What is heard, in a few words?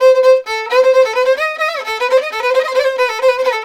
VIOLIN, Balkanish Traditions